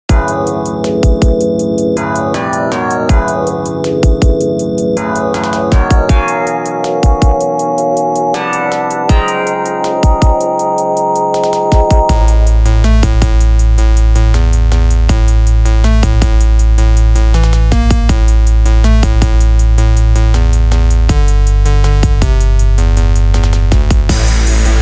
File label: Retro Trap Beat; Pony Music Archive